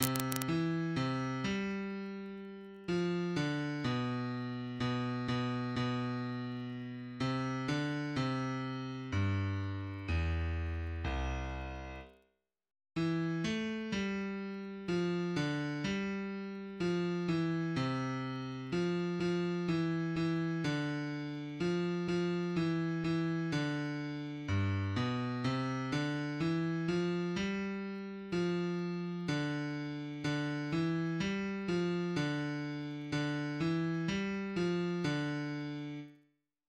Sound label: Argentine national anthem for harmonica in piano middle C